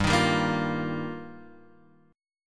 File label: G Minor 7th Sharp 5th; Gm7#5; strummed; JGuitar; x,x,x,0,3,2,0,2